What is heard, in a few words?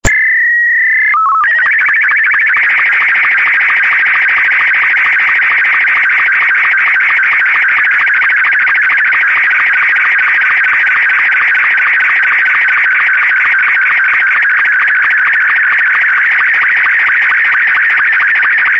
sstv robot 36